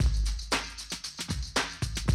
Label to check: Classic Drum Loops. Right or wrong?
Right